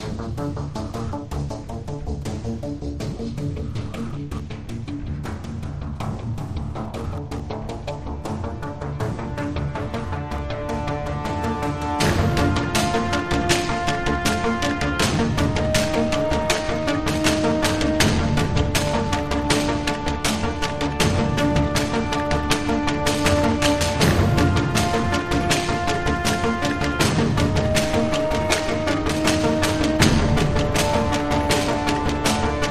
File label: Alt Percussion